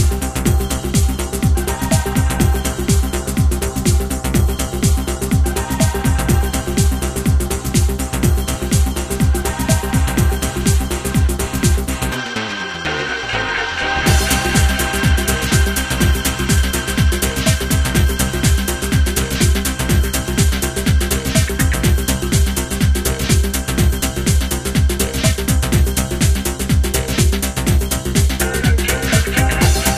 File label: Electric Guitar Humbucker